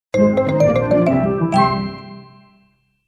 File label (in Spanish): jingle mision completada